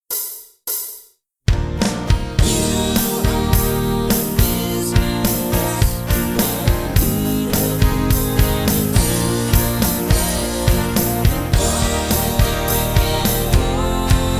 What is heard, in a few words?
karaoke